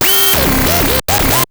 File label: Atonaloop, Industrial Analog